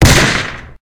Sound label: stormy Revolver Fire; Zomboid 41.78.16; Project Zomboid